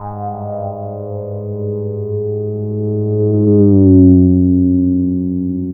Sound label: SFX, Drum & Bass, 140 BPM; Ueberschall; Drum & Bass Resonance